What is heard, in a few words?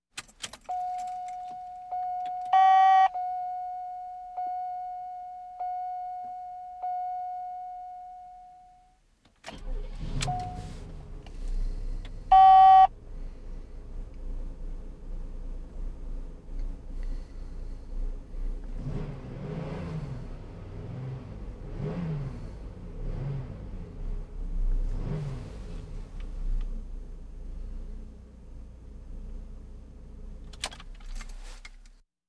Automobiles various